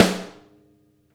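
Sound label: snare rim; Sonic Reality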